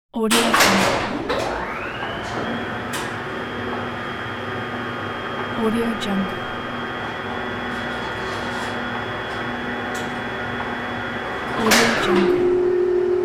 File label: elevator